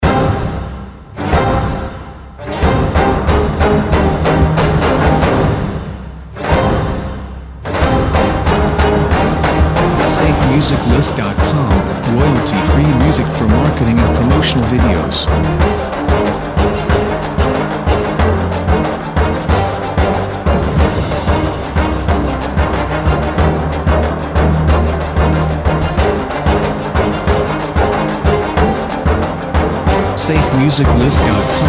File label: Blockbaster Trailer; SafeMusicList